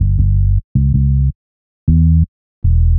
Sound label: Smooth Bass; Sonic Foundry (Sony Creative Software); Knutrix Drum & Bass